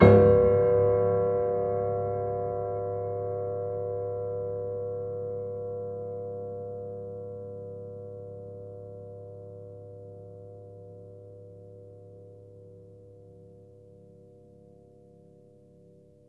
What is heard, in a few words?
Harm Ped Low, Piano Harmonics